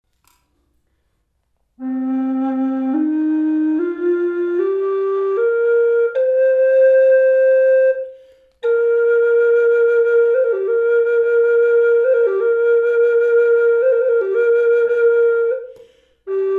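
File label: reverb, flute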